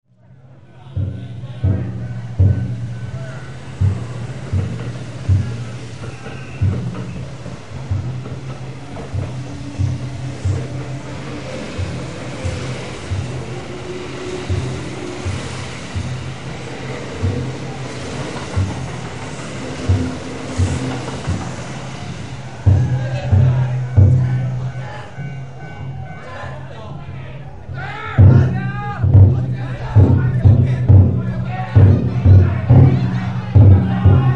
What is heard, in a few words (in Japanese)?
gojo fumikiri